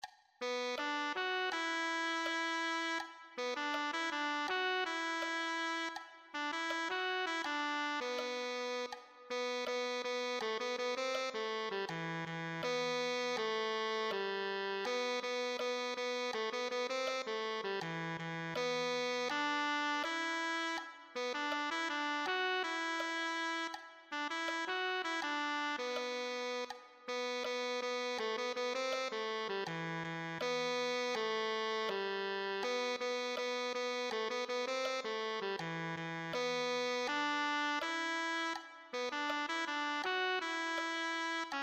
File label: tenor saxophone